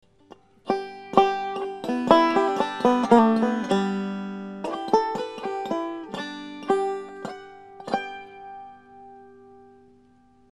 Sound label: ending